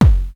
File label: hardbassdrumz, breakcore; Bazzdrumz